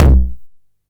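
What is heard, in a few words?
909 low tom, tape saturation; Goldbaby Tape808 & Tape909; The Tape 909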